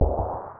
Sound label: small explosion; Powermanga